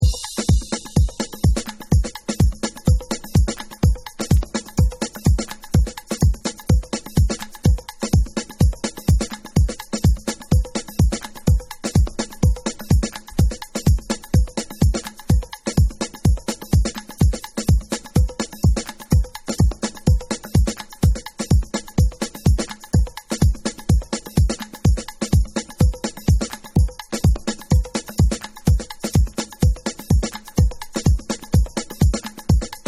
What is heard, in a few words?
Drums